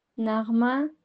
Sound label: melody